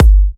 G, Kicks; markus hakala

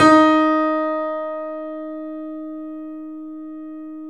D#, 3-D Audio Collection, 3D Pianos, Yamaha C7; E-MU Producer Series Vol. 5

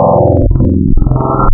FUZZ, wavetables Classic Synths

